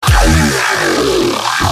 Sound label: Reptile Growl